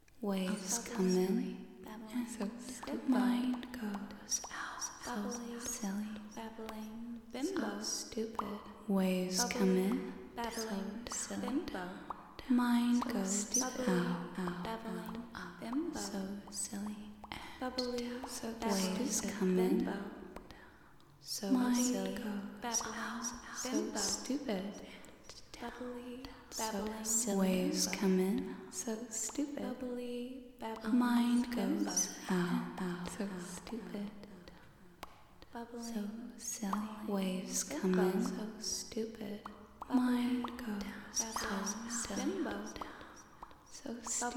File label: backing